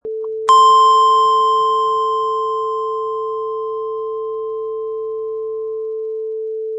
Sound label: Long Beep